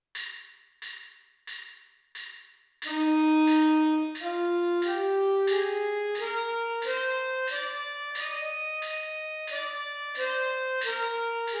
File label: c major scale alto sax upper octave